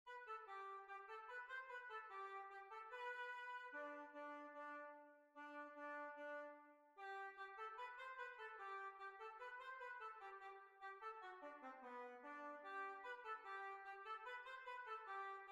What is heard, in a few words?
Grand Hornpipe